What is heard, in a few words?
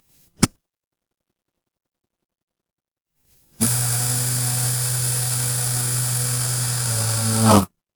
fire electric zap